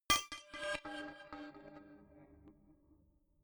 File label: notification glockenspiel crazy single low; instrumente